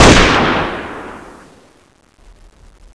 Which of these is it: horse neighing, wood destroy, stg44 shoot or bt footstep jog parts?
stg44 shoot